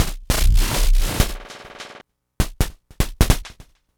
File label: Hi-Hat Esoterica, Beats; Sonic Foundry (Sony Creative Software)